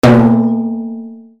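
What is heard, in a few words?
percussion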